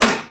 grenade launch

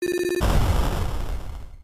minesweeper explosion, arcade

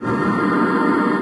curse

